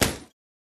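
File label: Boxing Bag Hit Short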